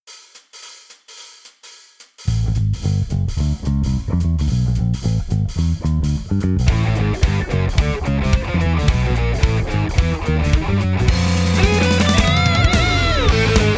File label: Dogbass; Undertale